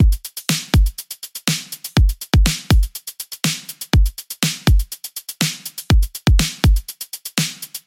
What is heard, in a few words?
122 BPM Battery Full Groove, full drum kit; Alchemy